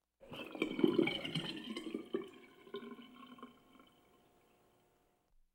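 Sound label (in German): In Der Küche Wasser Aus Der Spüle Ablassen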